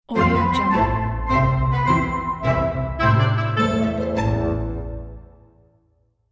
fairytale logo melody

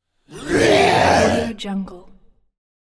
humanoid monster roar

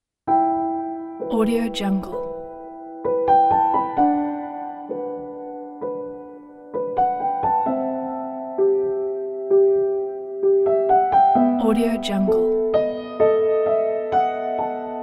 dramatic cinematic music

